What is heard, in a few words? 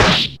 Ball Flies In; SNES; Bill Laimbeer's Combat Basketball